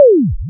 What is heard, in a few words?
slot no win sound